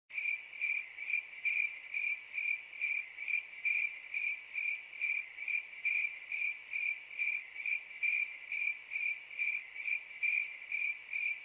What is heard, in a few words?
Crickets